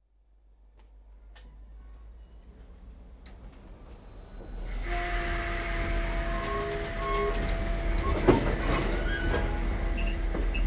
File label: chuff, steamship sound; steamship sound mock-up